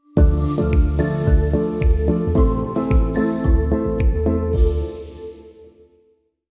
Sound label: Sting